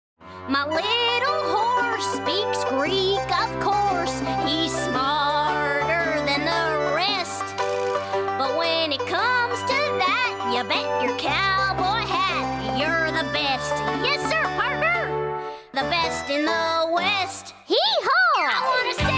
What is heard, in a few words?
singing